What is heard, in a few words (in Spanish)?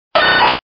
Grito de Sandshrew